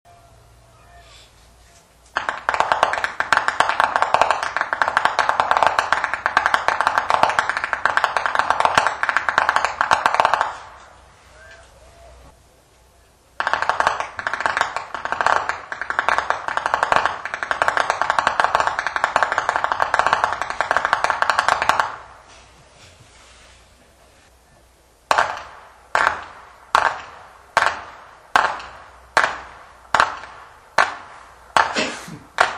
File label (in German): schnalzen, flachgau; muntigl